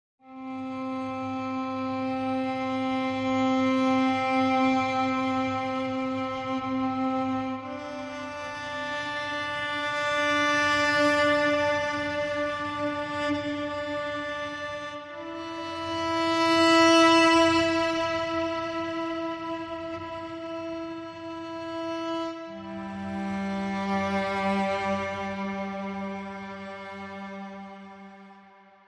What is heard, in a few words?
Cellos Only